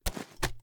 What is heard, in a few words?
reload fast end; gmod; wfoly plr sh charlie725